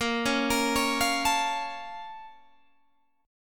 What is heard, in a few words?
A sharp Minor Major 7th, Guitar Open G